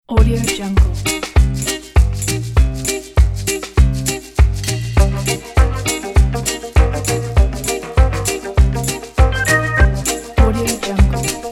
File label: cumbia